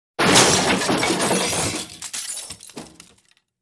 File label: Crash Wood Glass Imp